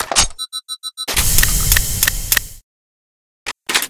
laserbore reload start; Tools; Barotrauma; EK modern

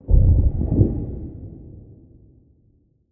Iceshark attack; Barotraumatic

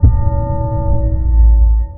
sonar Ping Suit Medium Beacon Directional; Barotrauma; Real Sonar

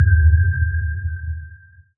sonar Tail Suit Very Close; Barotrauma; Real Sonar

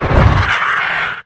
attack; Barotraumatic; Cthulhu; InsanityMonsters; Hallucination2; Mudraptor

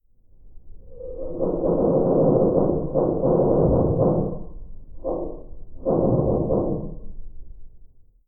Creak; Nuclear Mine; Barotraumatic

